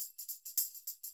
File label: TAMB, Percussionworks; Sampleheads; NYC Percussionworks; SF LOOPS 104